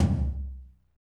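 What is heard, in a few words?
tom, medium techno, Roland; Northstar - Drumscapes Roland; Med. Tekno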